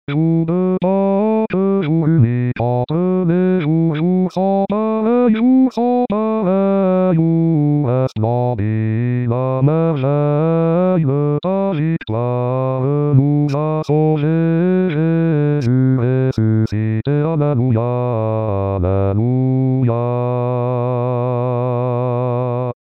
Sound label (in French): Jour de Paques Basse, Chorale